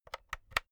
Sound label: insert usb b hard; objekte; elektronik